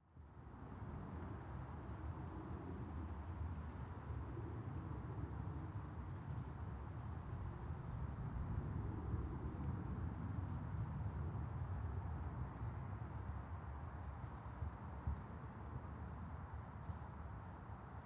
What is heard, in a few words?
outside ambience. far away highway